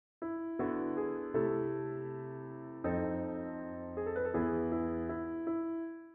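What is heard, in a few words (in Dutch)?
gealtereerd, parallelle kwint tussen tenor en bas; Deze kwint wordt als acceptabel beschouwd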